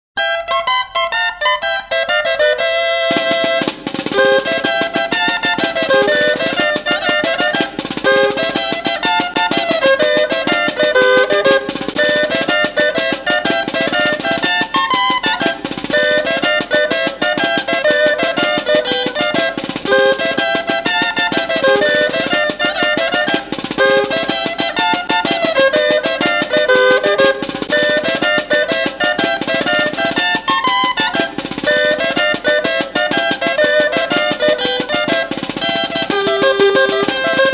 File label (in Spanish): Marcha procesional de espadas